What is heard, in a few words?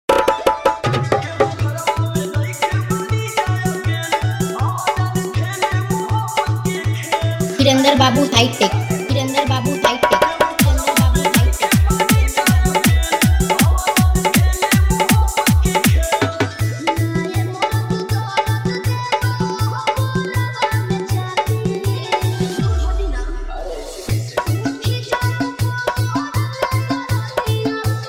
full dance jumping barat hard dholki bass dj remix